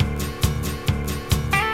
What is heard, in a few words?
piano, Loops; Phonky